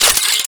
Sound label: Rocket Launcher Charge; UT Weapons